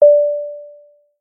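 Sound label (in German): ding, Cockpit Warnglocken; Warnglockenton, aus einem Airbus Cockpit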